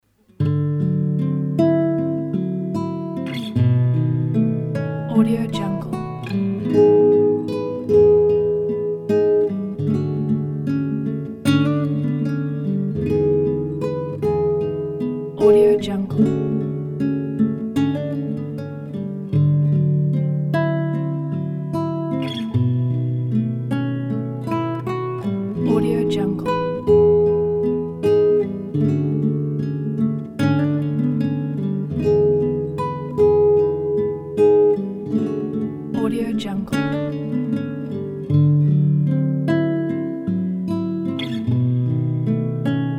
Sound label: acoustic guitar